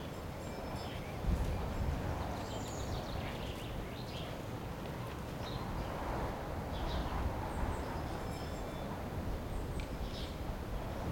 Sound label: amb dinners outskirts